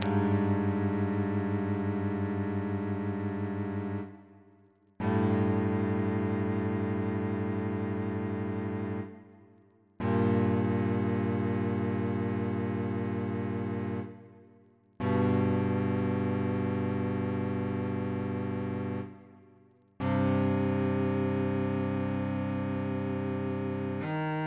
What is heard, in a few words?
from midi